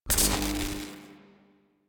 Scrap Container Start; Warframe; Missions; Zariman; Zariman Assassinate

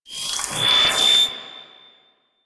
Archwing Scythe heavy attack charge; Melee; Warframe; Exalted Archwing Scythe

